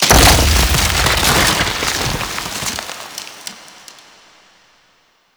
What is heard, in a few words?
Ice Wall Break; Ambience; Mountain Pass; Oro Sculpture; Warframe; The War Within